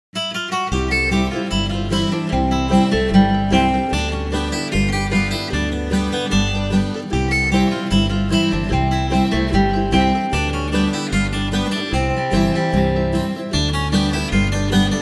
running fast, 2 Gitarren; blues; ragtime

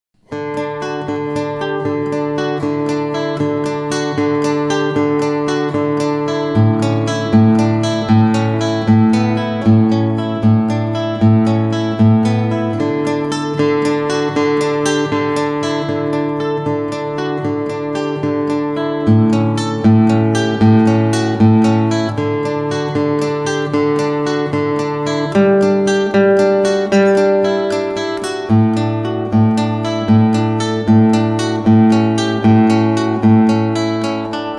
karussel, Gitarre; klassisch

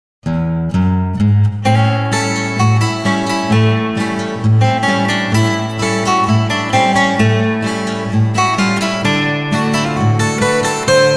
country blues, gitarren; ragtime; 2 gitarren

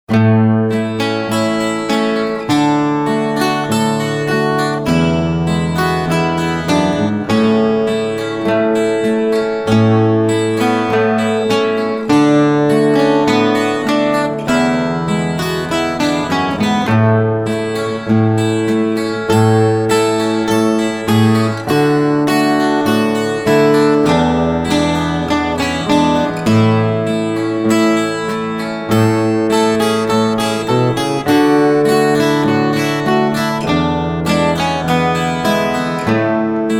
lazy boys, Gitarre; blues; ragtime

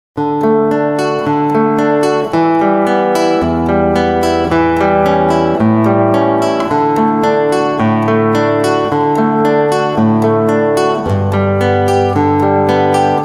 intermezzo, Gitarre; klassisch

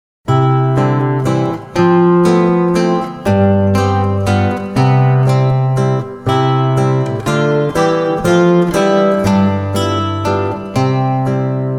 intermezzo, Gitarre; klassisch

